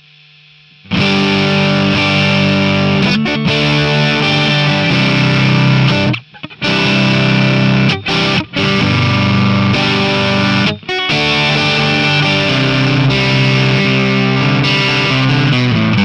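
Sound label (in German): Störgeräusche telecaster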